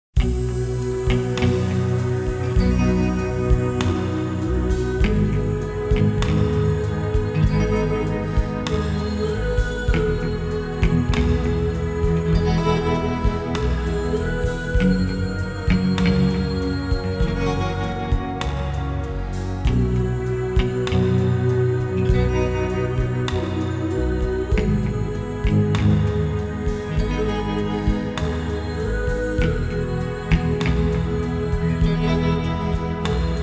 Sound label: the nightingale vocal